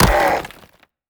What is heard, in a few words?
Golem Hurt